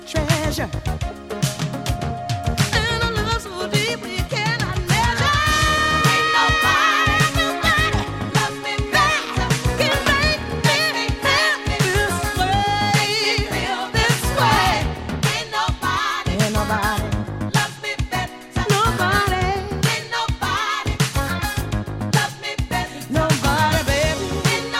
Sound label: 12 Inch Dance 80s Groove